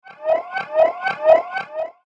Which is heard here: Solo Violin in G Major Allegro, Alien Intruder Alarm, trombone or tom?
Alien Intruder Alarm